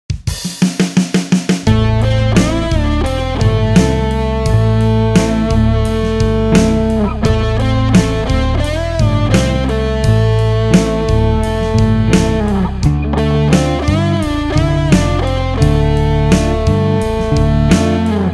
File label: Maximum Pentatonic Power, guitar